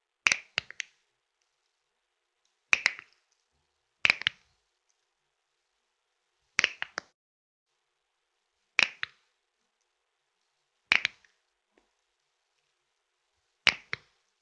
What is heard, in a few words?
Cracking Knuckles